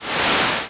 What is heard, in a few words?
whoosh